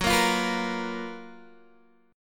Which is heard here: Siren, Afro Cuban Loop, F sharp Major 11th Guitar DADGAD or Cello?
F sharp Major 11th Guitar DADGAD